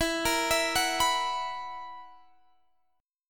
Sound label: B Major 7th Suspended 4th, guitar chord